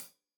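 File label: hat closed, hi-hat cymbals stereo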